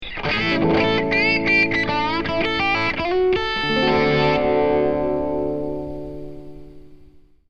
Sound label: a7 to d7 guitar lick